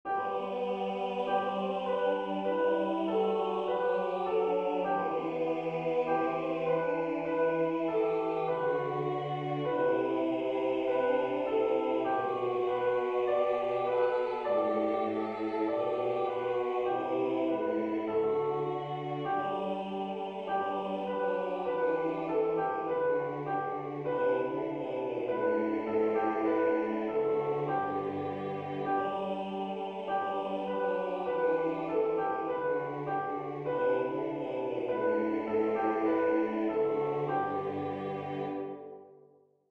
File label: soprano tutti